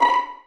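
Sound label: Vio pizzicato B4; Digital Sound Factory Virtuoso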